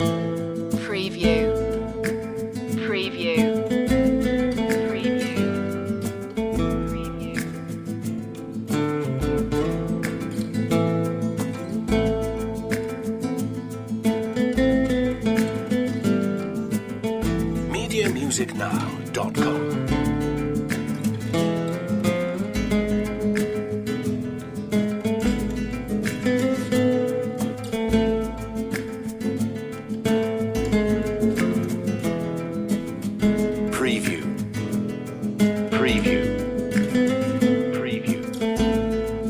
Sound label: spanish storm loop